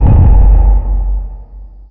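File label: Collision Interior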